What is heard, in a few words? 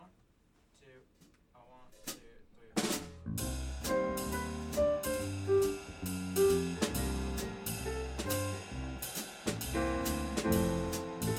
jazz Broken Beat